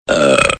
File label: Burp